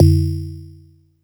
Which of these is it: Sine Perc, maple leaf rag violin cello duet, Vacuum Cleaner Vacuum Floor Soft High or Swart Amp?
Sine Perc